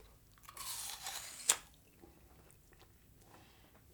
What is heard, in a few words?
Apple Bite Chew